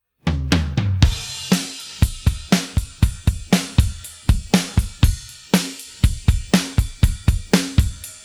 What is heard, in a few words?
drums pattern